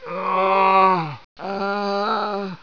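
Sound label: prisoner moan; ambience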